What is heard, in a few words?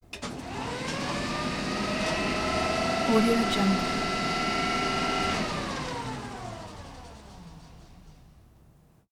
electric vehicle turn on; evan; prosoundfx